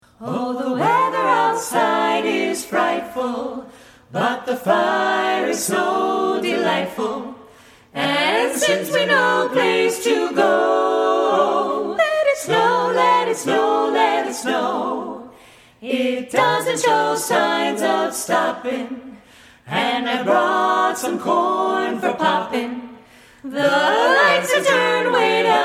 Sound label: Carolers